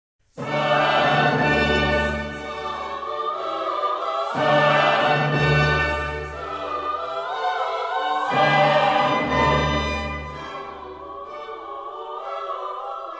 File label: Mass in B minor, Sanctus; bach